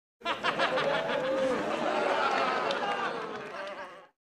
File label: Crowd Short Burst, small int